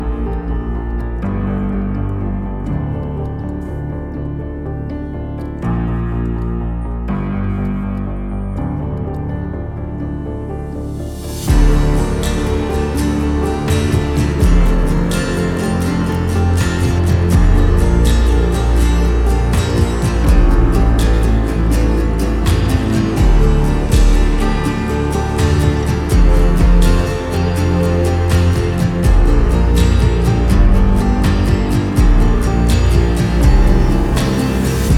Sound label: Sing Like a Siren Instrumental